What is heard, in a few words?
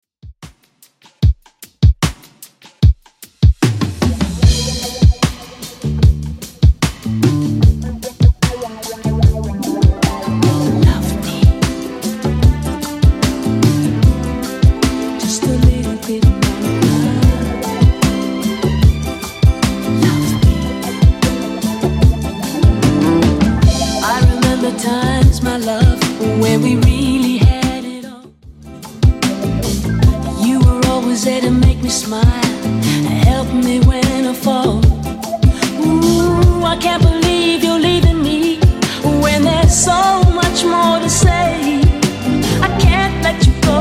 70s Redrum 75bpm CLEAN; remixplanet